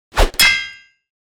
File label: sword swing hit, melee